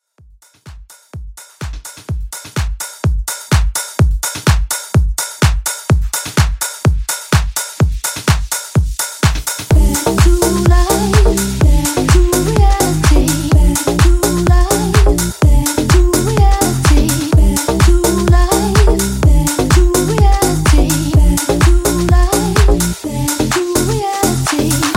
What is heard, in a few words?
Deep House Hype